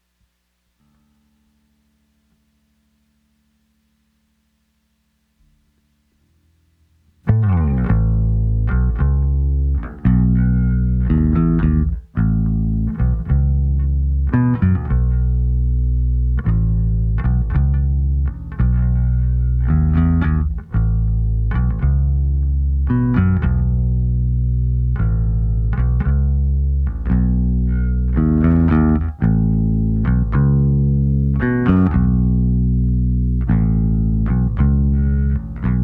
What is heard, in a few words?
bass